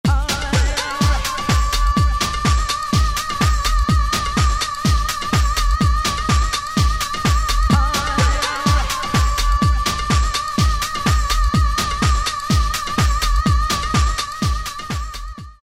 125 Diva Loop; House; Roland SR-JV80-19 House Collection

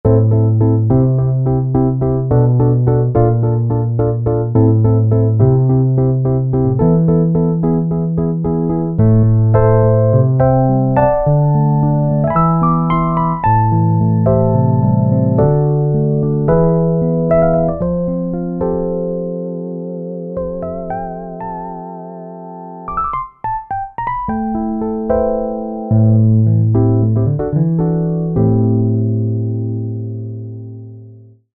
Pianohner, Roland SRX-07; Roland SRX-07 Ultimate Keys

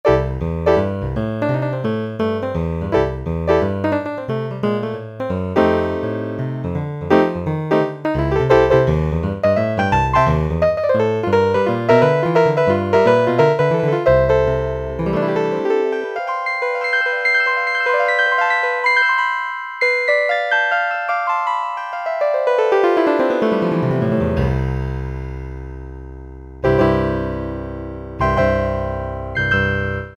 Piano, Kawai K1m; Factory patches - I banks

